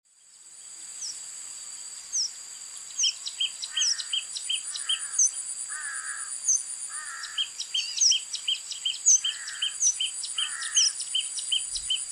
Avadavat Sound

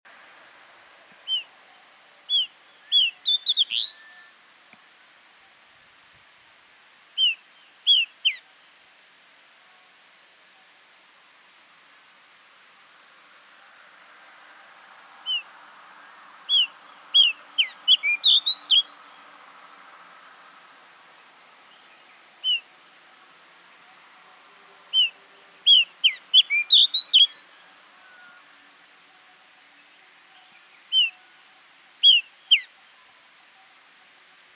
Bushchat Sound

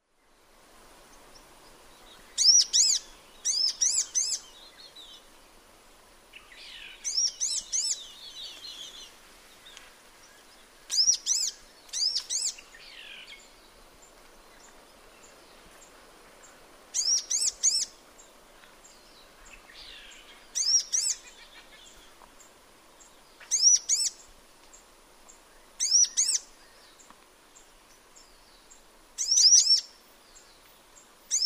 Sunbird Sound

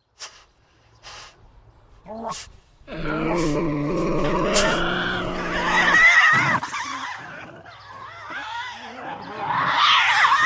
Tasmanian Devil Sound

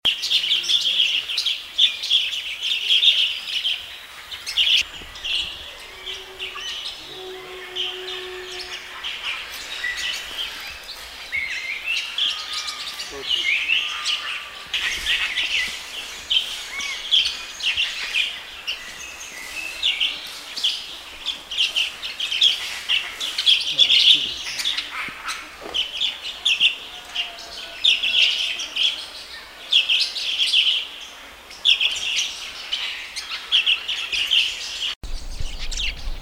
Lovebird Sound

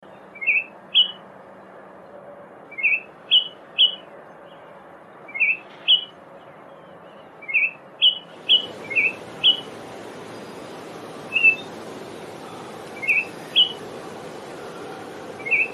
Baltimore Oriole

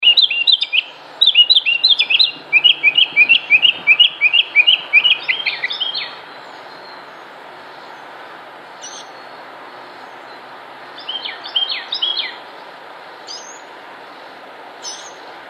Mocking Bird Sound

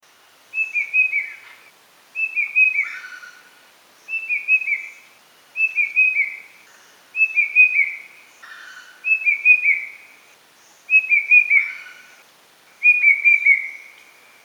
Cuckoo Sound

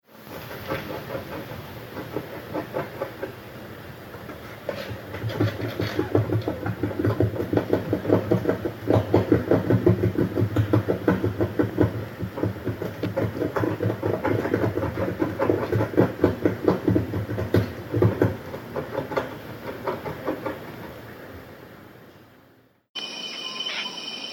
Procupine Sound

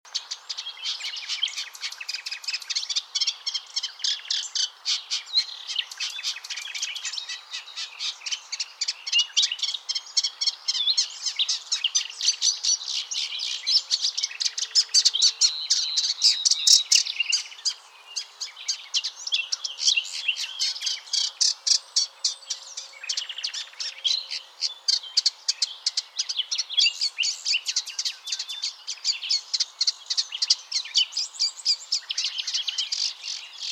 Warbler Sound

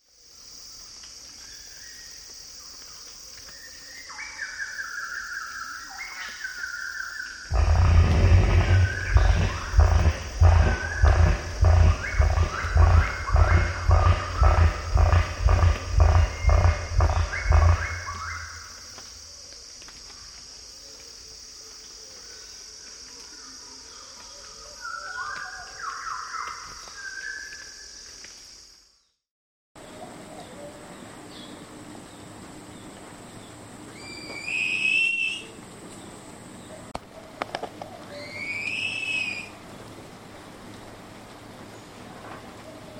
Cassowary Sound

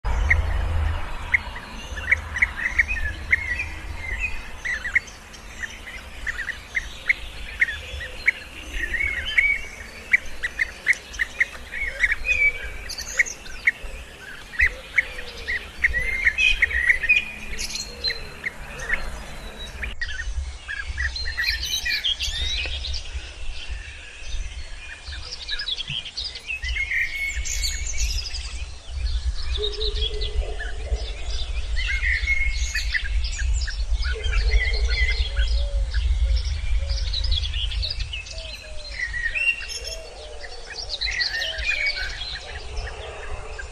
Rainbow Bee Eater

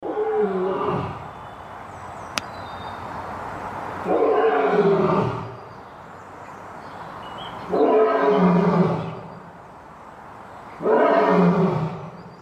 Mighty Lion Roar Powerful Jungle King Sound

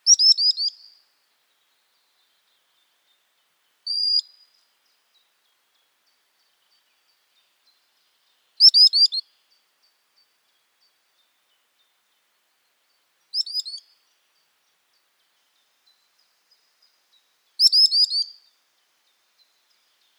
Sandpiper Sound

